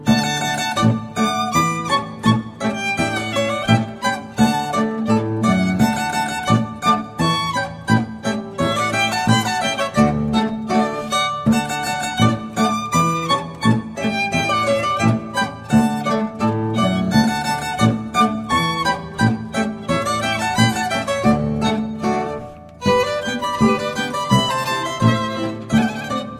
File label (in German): Boarischer, zither; Grabitzer Bacherl Boarischer